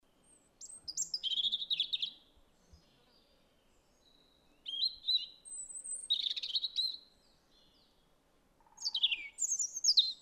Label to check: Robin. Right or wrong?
Right